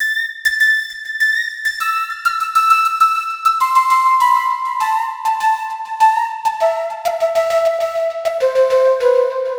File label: Flute 100BPM Amin; Nuptse; 99Sounds x Monosounds; Instrument Loops